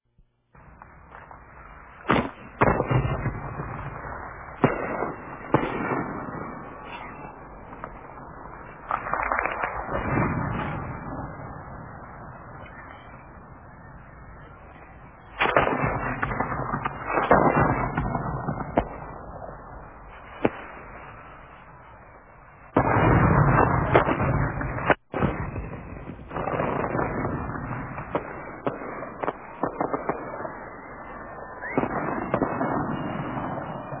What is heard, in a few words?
Boom